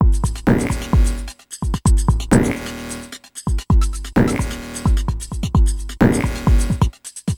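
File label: Messup Loop, beats; Sonic Foundry (Sony Creative Software); Esoterik Beatz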